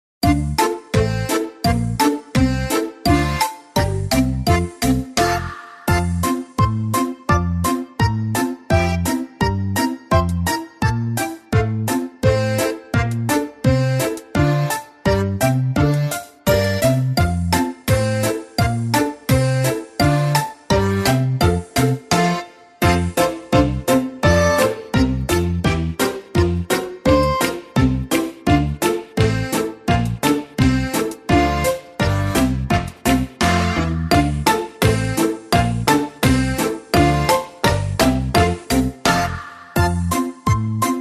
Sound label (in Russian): belorusskaya narodnaya melodiya; vot tak vot